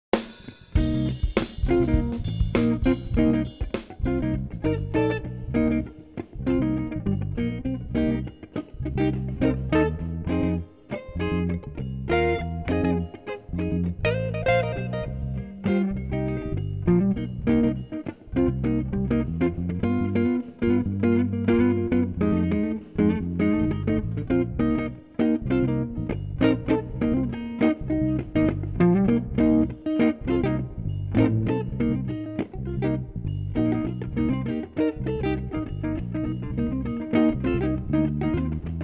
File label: Funky Blues NO DRUMS